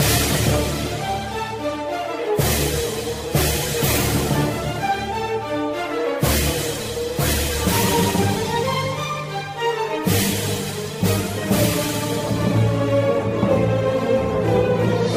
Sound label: strings, sad loop